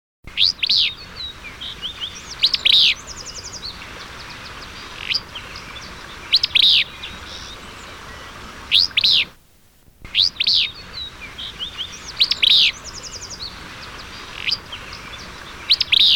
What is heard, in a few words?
willow flycatcher; calls; Audubon